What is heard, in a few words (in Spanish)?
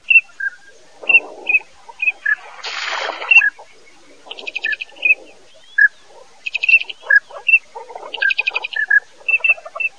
BARCINO MAICERO